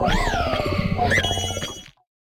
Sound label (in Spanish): Grito de Ferropaladín; Gritos de Pokémon de la novena generación